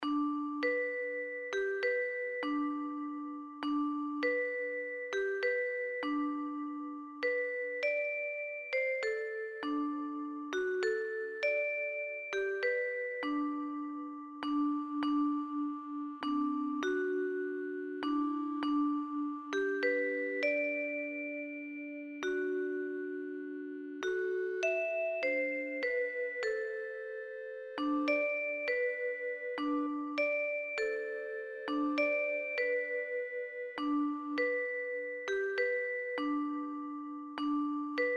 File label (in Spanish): 1 VOZ